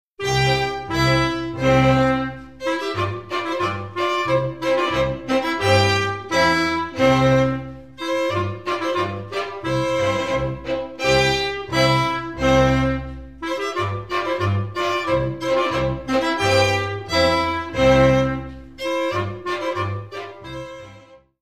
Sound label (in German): Aufzugsmusik